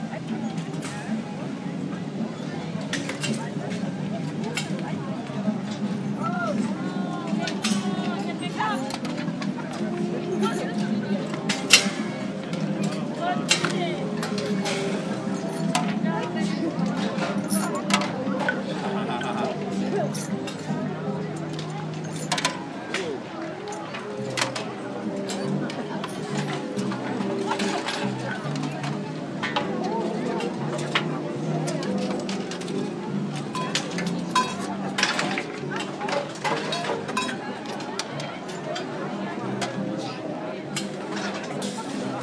Alexanderplatz tourist contraption attractions ambience berlin